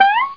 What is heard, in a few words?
Button